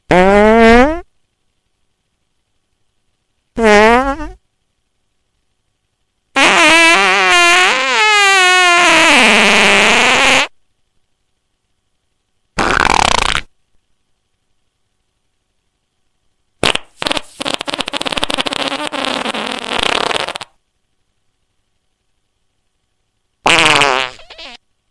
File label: smoke bomb funny ringtone